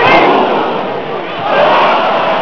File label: CROWD OOH; PEOPLE